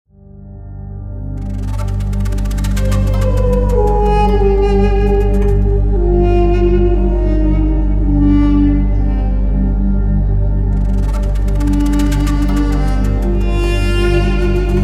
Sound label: music